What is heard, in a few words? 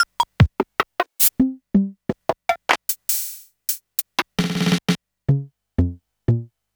Technics U90